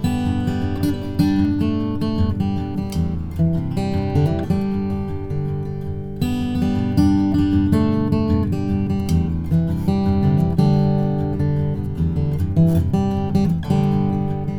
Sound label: Fingerpicked Guitar